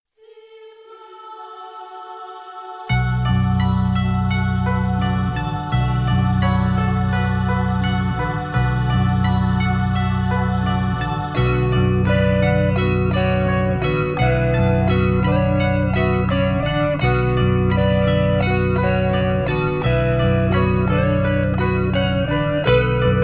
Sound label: Backing Track